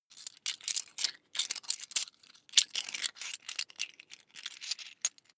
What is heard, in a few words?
coins big clatter long